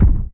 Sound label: thud; fight